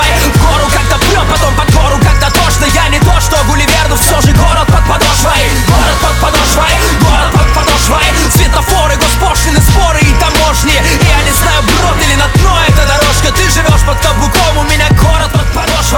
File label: Gorgorod Loop